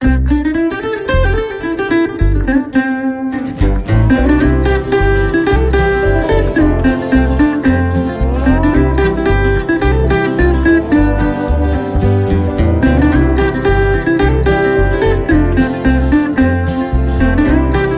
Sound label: Cowboy Bluegrass, No Drums